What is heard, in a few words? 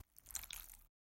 squishy short; soundscrate